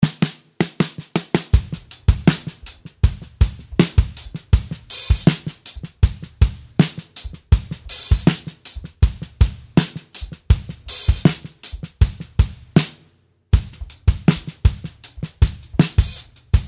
Chilled Drums

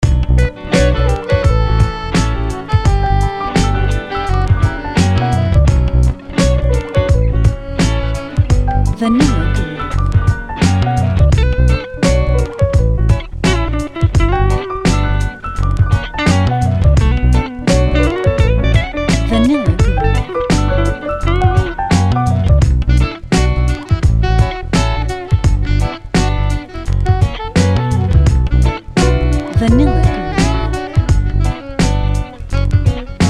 Chilled Keys Horns Guitars

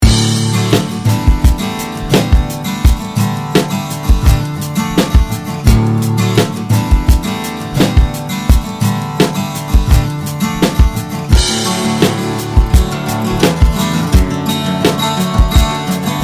Drop D Guitars

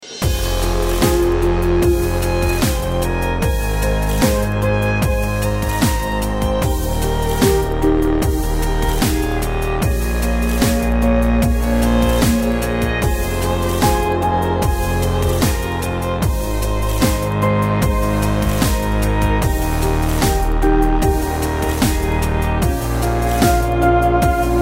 Chilled Violin and Guitar

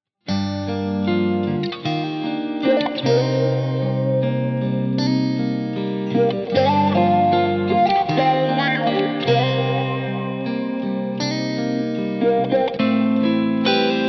Laid Back Guitars

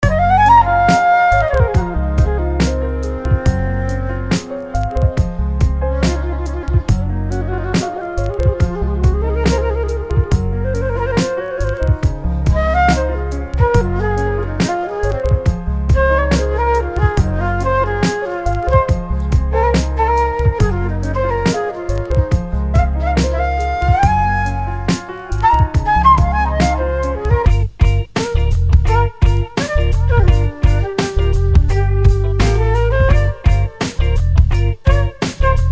Evocative Flutes

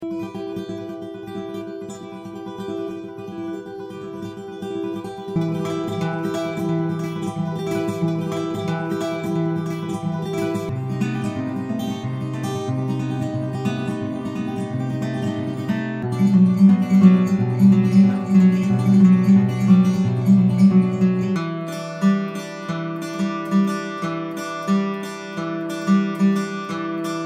Chillstep Acoustic Guitars

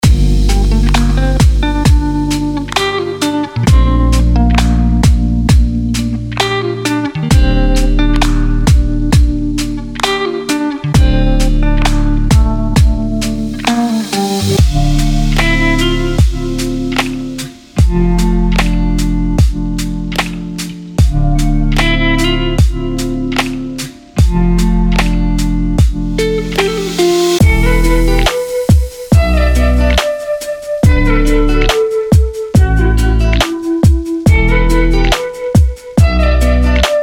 LoFi Grooves

